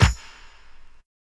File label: combi drums